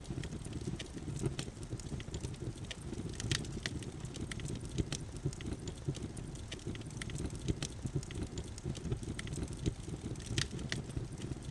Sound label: Fire; SynTex